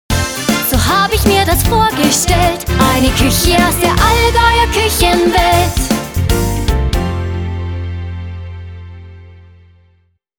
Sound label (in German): Jingle Gesang; Allgäuer Küchenwelt